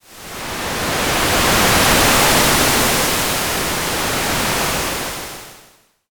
wave

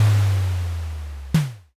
castle gate

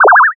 teleport

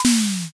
umbrella

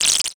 text anim

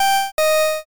intercom ding

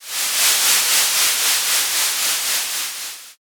torch

